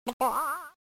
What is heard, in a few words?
chicken